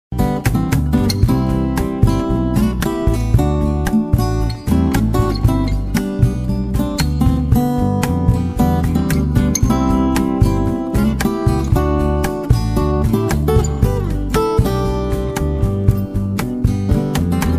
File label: melody